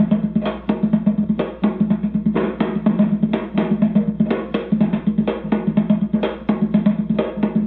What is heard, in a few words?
Loop, rhythmate, classic machines